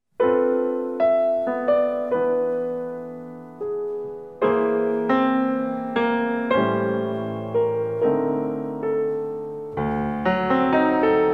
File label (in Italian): Preludi Per Pianoforte; Dieci Preludi